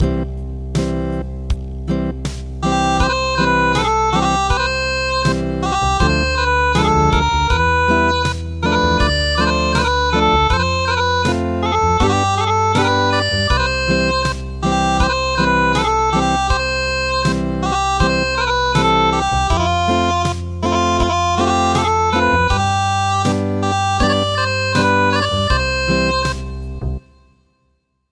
Acoustic; The wooden clogs

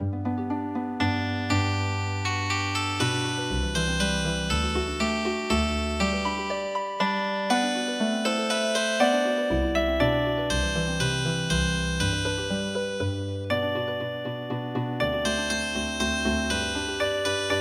Healing Harp

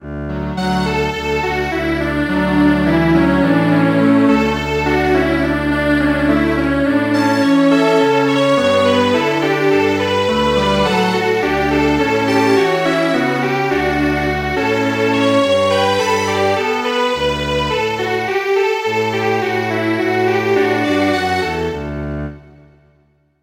Strings Quartet, midi traditional; Your handkerchief

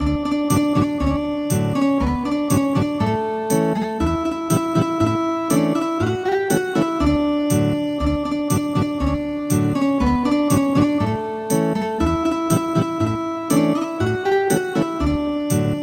Ethnic Guitar; Anak kambing saya

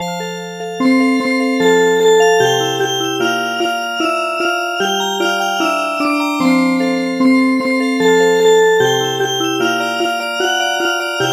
Music Box, midi traditional; Wont you pass Mistress Mary

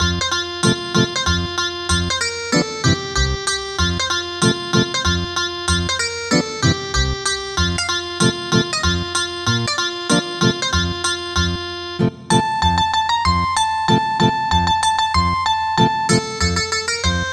Ethnic Guitar

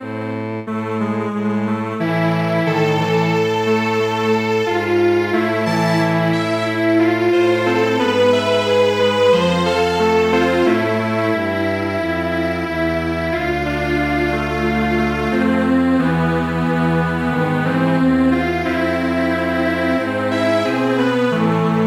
Strings Quartet; Bubuy bulan

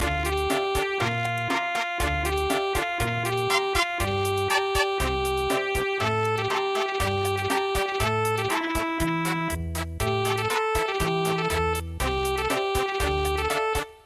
Cha Cha Cha; go away pain

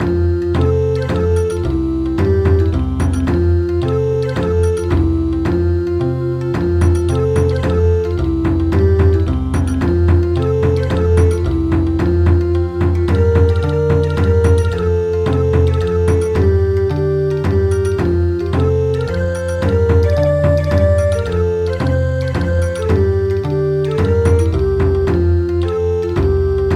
Bon Odori; Hooray For You Who Turn Your Year

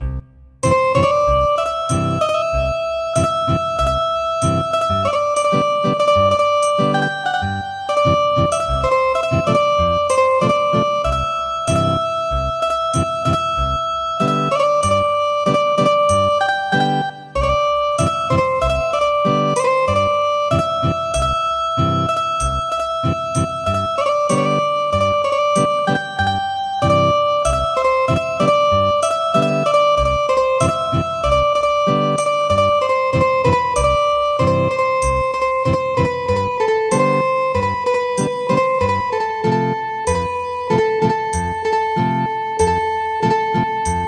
Yemen Song Ethnic Guitar

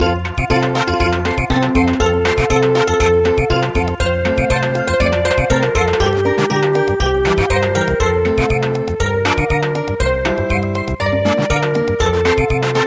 Samba; Live Long

